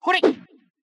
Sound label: slash; ebusimaru